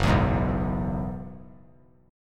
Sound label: Gb 9th Suspended 4th Gb down, chord; Gb9sus4; strummed; JGuitar